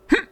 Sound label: Hop